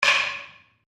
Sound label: Wood On Wood; Material components for constructing more complex sounds; Materials; Foundation4